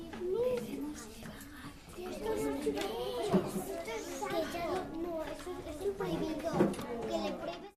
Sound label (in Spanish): El ruido